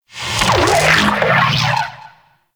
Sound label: Sentient Vocal Attack; Warframe; Sentients; Dolicholyst